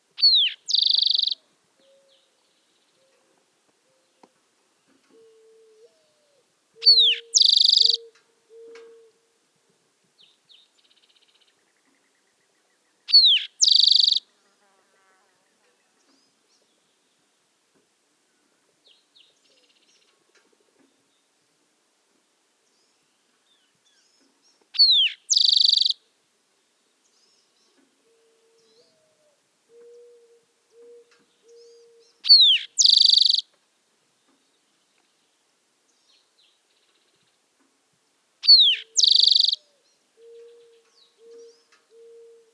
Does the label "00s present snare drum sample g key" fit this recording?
No